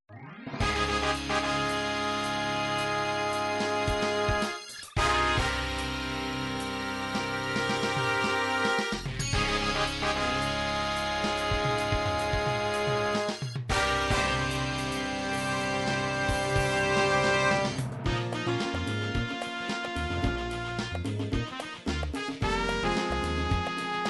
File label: cha cha cha, midi